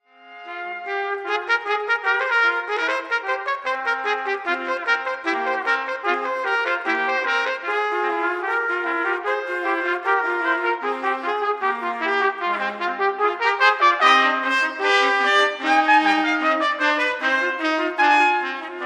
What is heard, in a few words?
Fugue in G-Minor